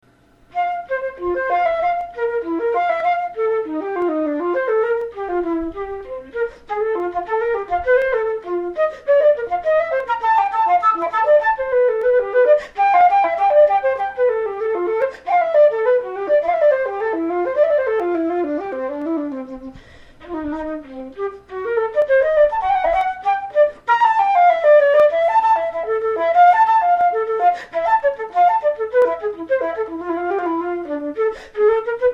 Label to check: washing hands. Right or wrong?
Wrong